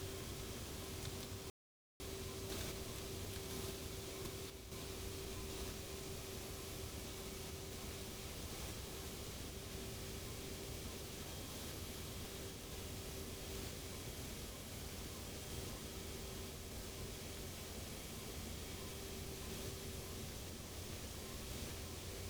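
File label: hydrophone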